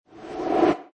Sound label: Transition, Generic scene transition sound effects with slight reverb; Wipes (Sound Effects:Sound Design)